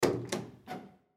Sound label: Ship Bathroom Door Open Head; Transportation Watercraft; Boat Sound Effects; Blastwave